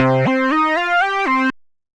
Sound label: Bass Sequencer, Synth Rhythmic; A1A Bass Sequencer; Ableton; Factory Packs; Synth Essentials